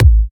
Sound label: Bassdrum